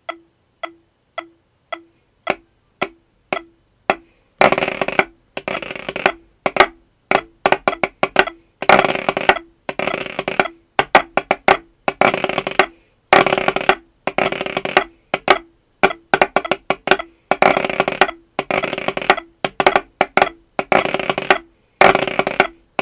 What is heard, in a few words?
Highland Fling